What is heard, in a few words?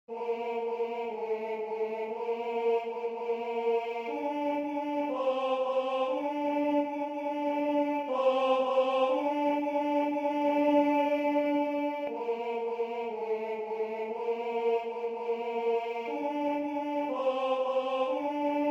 Tenor; Sanctus; Messe De San Lorenzo